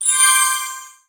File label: heal; Dot Line